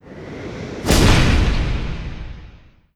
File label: Thunder Bolt; gamerip; disney star smash; android; Enemy